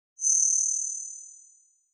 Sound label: hi freq pulse